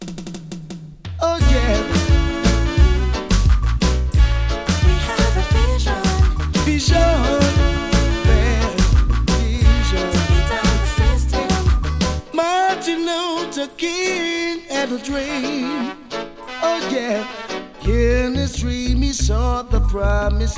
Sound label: riddim